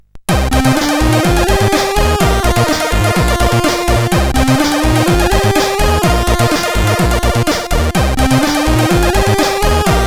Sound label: Chip Power 4 Mod, CSG8580R5